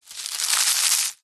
foley foliage dry crush squeeze crunchy crispy thick